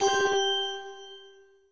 Few Coin Gain

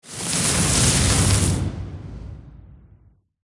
Music Frontend

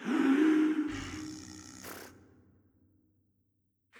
Rallying Horn

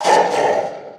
Finalboss Vox Taunt; Folk Hero

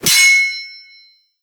Sword Whoosh Hit Sword Hard Mono

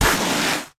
Footstep Skid; starbites

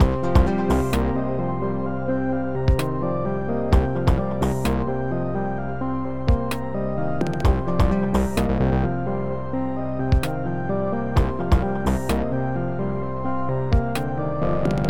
Forest Main Loop

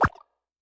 Obstacle Wardrobe Hat launch; In Obstacle Wardrobe Hatlaunch

